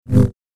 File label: Double Bladed Saber Sound; FXHome